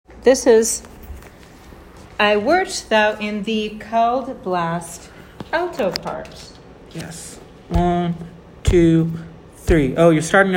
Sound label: alto